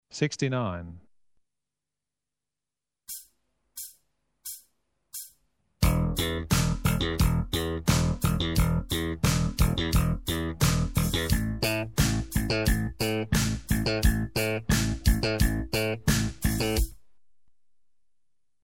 sixtynine, tercinas slap, baixo

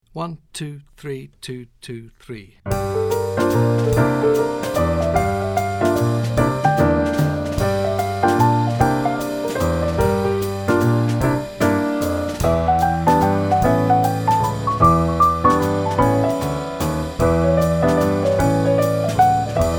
G Minor Waltz, teclado

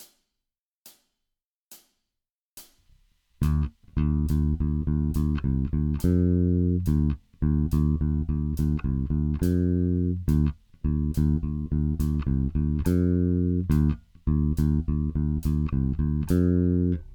Heavy rock blues, baixo; heavy rock shuffle

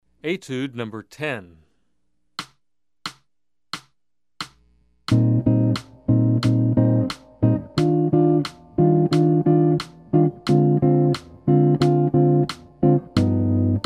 Guitar Track Beginning Jazz Guitar; etude 10

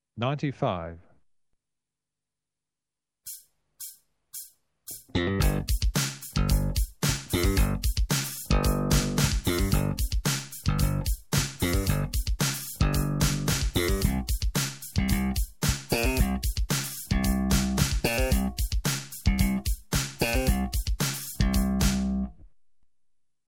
patterns slap; baixo

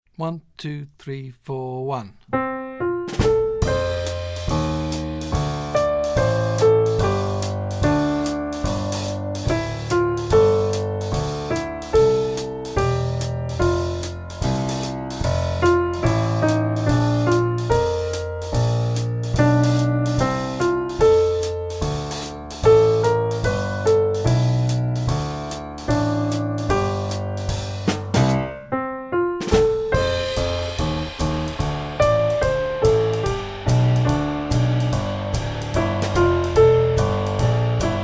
straight melody, teclado; jazz iniciante

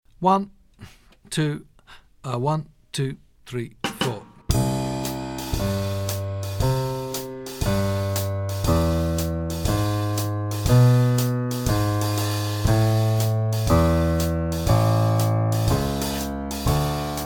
Swing Comping Two feel Bass Line, teclado; jazz iniciante

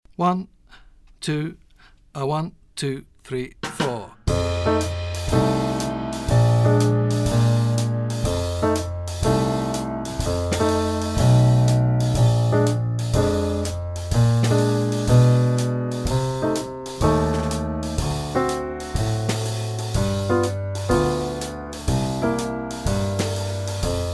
Blues in F Comping with two feel bass, teclado; jazz iniciante

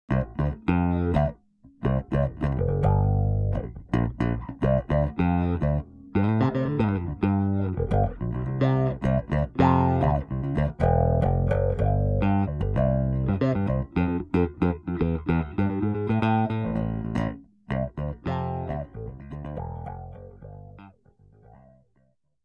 VCF Bass; Dan Dean

